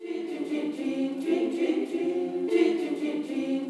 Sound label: GIRL CHR, FEM. CHOIR; Spectrasonics; Heart of Africa 2